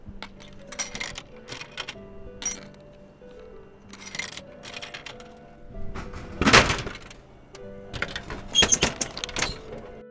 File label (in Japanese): 自販機